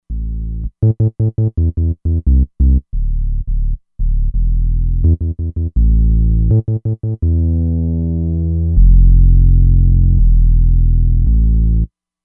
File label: Bass Subbass